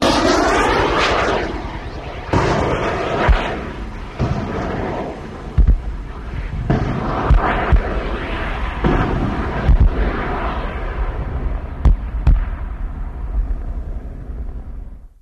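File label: Rocket Rev For Rocket Pass By; Rocket Rev Edit For Rocket Pass By; small rockets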